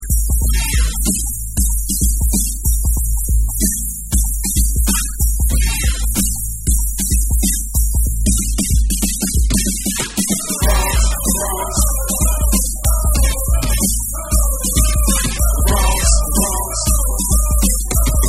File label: Instrumental Version